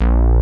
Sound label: MOOG A#0; E-MU Formula 4000 Series Vol. 5; Protozoa